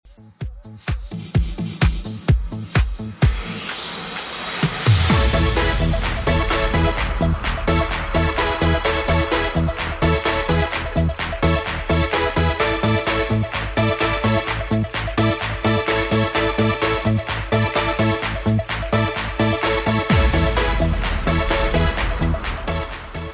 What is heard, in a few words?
Club House 128bpm CLEAN